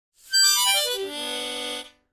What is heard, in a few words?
Mouth Organ Glissade, harmonica; woodwinds